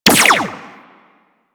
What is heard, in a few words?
Burst Laser Pistol Fire; DE; Warframe; Weapons; Sentinel